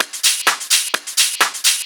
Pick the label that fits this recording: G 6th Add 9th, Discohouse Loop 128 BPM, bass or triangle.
Discohouse Loop 128 BPM